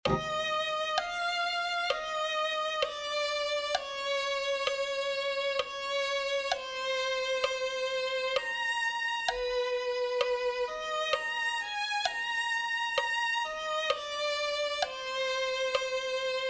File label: violin